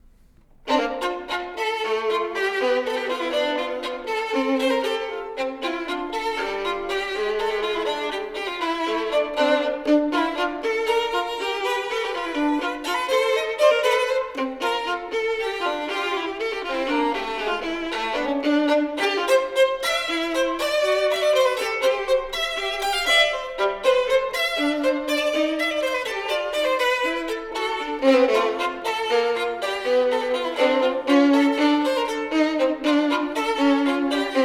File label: Duos for Two Violins; 44 Duos for Two Violins, Sz. 98 No. 31, New Year's Greeting IV